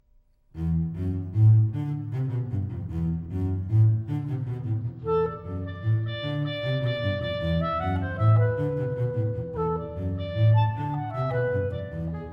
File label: Clarinet and Double Bass, Brisk